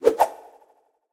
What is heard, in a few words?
Swoosh